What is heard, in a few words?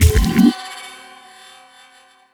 portal invalid surface, portalgun; garrysmod